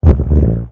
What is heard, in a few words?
bubbly, tuba